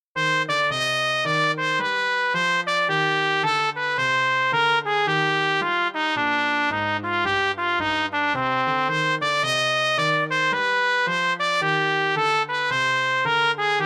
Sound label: trumpet; Cmusic Production; virtuelle Solo Trompeten; Solo Trumpet 1